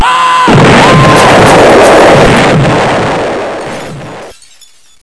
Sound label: chopper